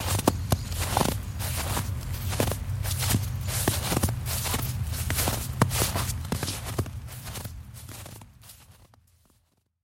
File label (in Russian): shagi po snegu